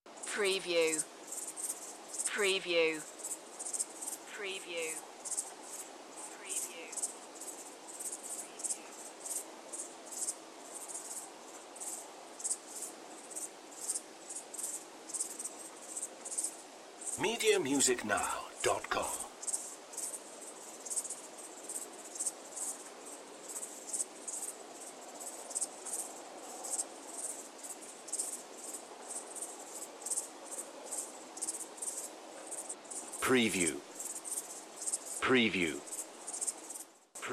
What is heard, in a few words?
Crickets